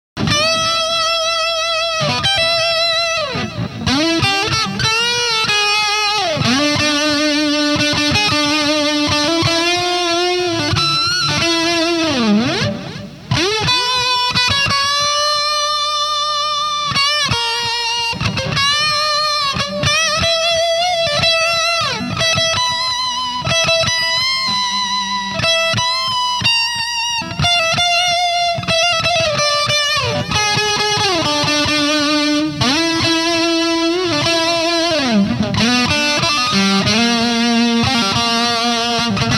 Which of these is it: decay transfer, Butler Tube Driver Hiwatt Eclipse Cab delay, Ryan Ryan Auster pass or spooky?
Butler Tube Driver Hiwatt Eclipse Cab delay